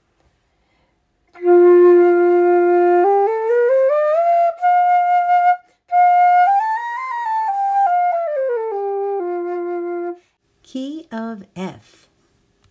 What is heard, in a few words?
Key of F, bamboo flutes